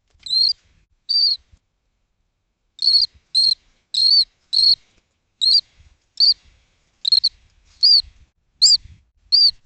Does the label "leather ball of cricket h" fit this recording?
Yes